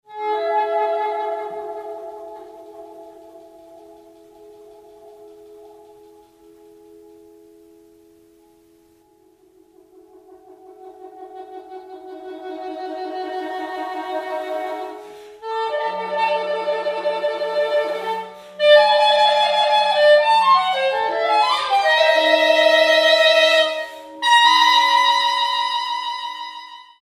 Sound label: sax